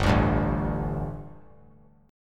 G Suspended 2nd G, down; Gsus2 strummed; JGuitar

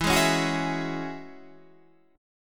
E 6th Add 9th E down Guitar Open D

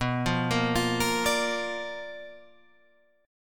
B Minor Major 7th Flat 5th Guitar Drop D

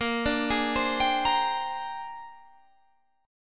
A sharp 13th, Guitar Standard; arpeggiated; JGuitar

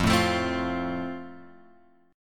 F Major, F Chord; F Chord {1 0 X 2 1 1} strummed

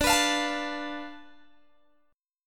D Minor 6th Guitar Open G

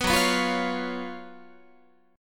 F sharp Major 7th Suspended 4th, Guitar; F#M7sus4 strummed

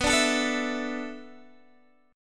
B Minor 11th B down Guitar Open D

